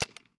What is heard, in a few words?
carbine empty; 68 carbine